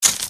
camera

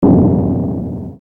explo

